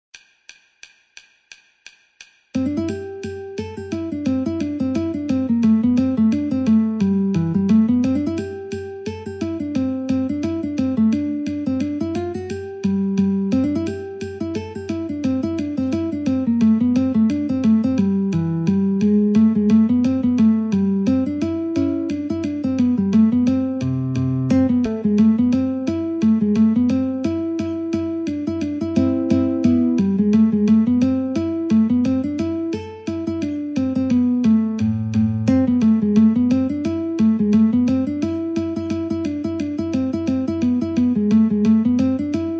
Guitar metronome88

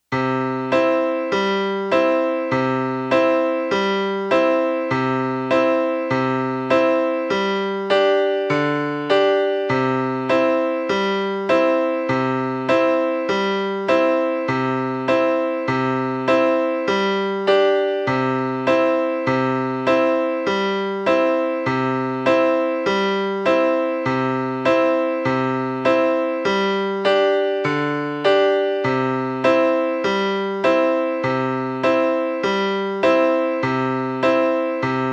accomp.

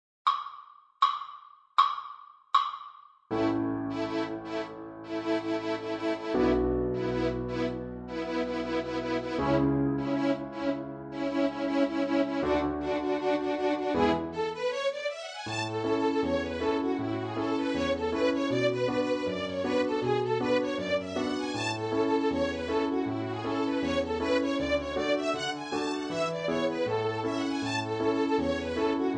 Masons Apron high

